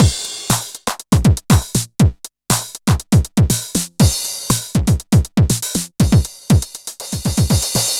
909 NOISY; Wizoo - Platinum 24 Electronic; DEMO KIT

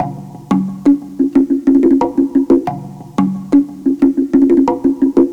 fuzzy logic conga

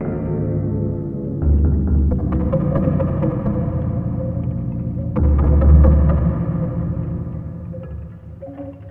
EERIE; ENVIRN-AMB

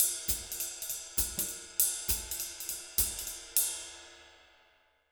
Jazz Latin Drumloops; USB Soundscan vol.08; AKAI

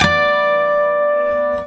guit pluck; Sonic Foundry (Sony Creative Software); Ugly Remnants VOL-2

